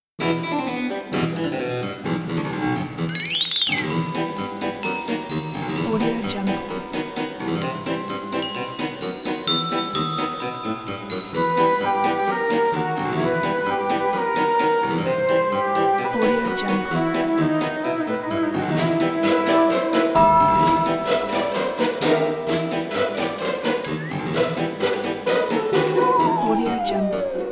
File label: spooky fun